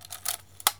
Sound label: Camera